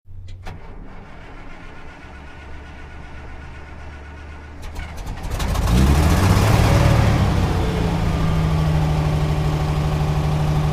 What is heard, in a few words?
Metallic Squeaks Belt, conveyor belt